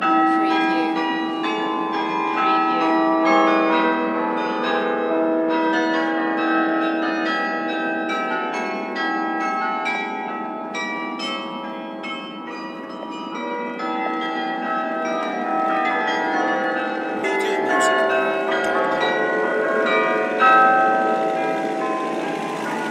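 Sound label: Bells Carillon Canada Parliament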